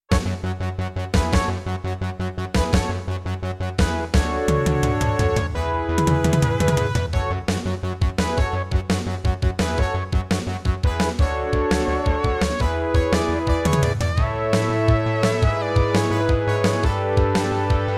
Intro, Roland SC-88ST Pro MT32 Mode; 4D Sports Boxing

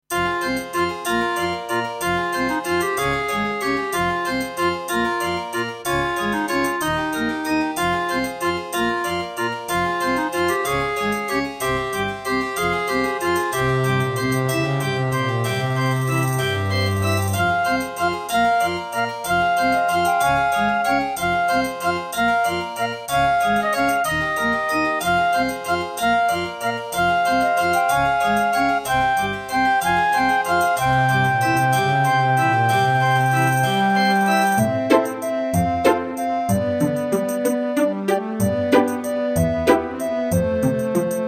Roland RAP-10; Death Gate

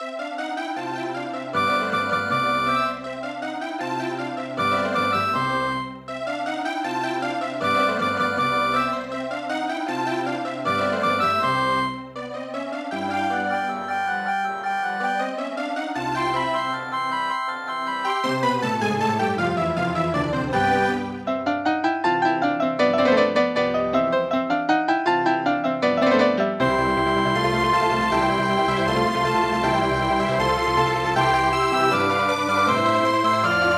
Alesis Harmony 32; classical; Mozart; Concerto K467 partial

